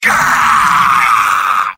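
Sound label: sniper pain critical death; vo; tf2; mvm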